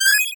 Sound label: 8bit se